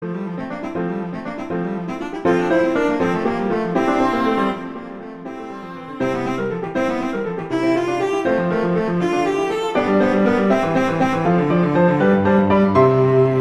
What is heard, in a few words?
Allegro; Vivaldi; Op14 No2